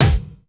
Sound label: DRUM, FUNK KITS; Crypton - DrumBase5000